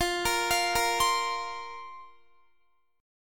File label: F Suspended 4th F, Guitar; Fsus4; arpeggiated; x,20,22,22,22,20